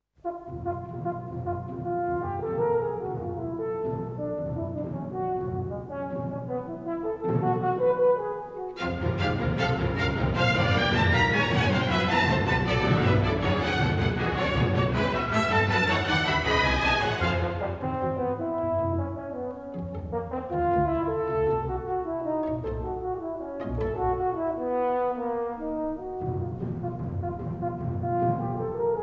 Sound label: Horn Concerto: Very fast; Strauss, Hindemith